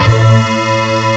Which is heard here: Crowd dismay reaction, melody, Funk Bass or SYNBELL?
SYNBELL